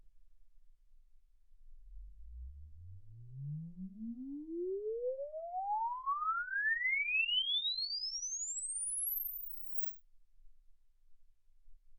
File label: exp swept sinus 10Hz 24kHz